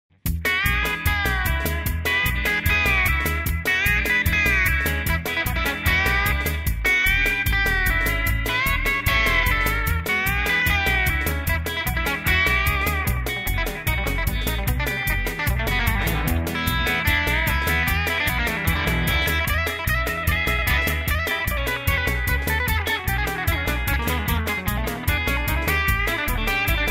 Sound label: chicken pickin